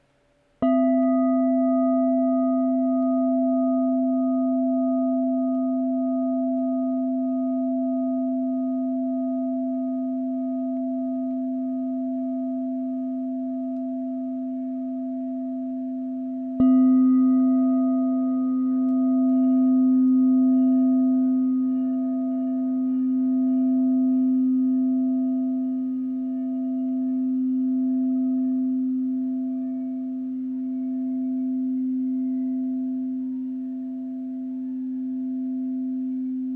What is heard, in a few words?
7inch C -25, crystal bowl